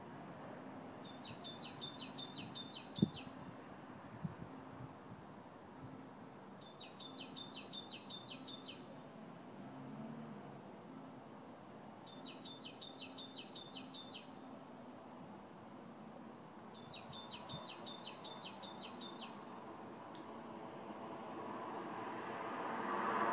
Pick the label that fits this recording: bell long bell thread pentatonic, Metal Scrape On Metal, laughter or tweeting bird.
tweeting bird